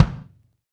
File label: KIK, Real Kiks; Club-50 - Foundations Roland